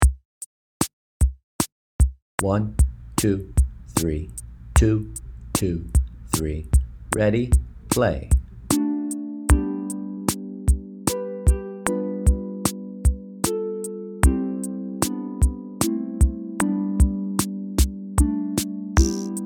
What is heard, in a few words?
Amazing Grace Click Track TUTTI